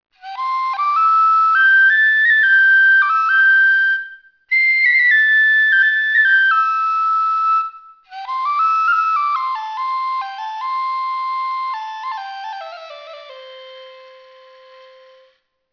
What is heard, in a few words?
Fyfe